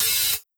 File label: OPENHAT